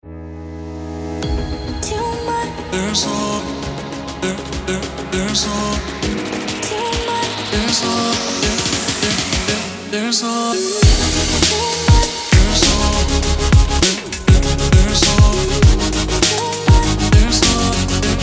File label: Summer Beat